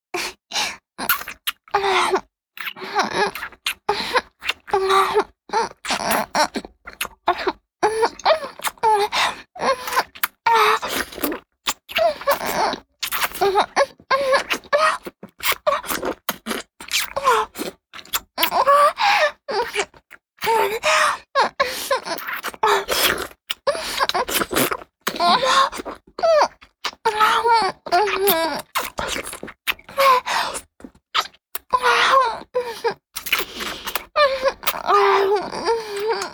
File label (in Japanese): フェラチオ(中):亀頭をしゃぶる